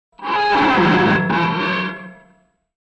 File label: metal, gnissel